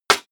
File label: impact metal bright; maschinen; soundpool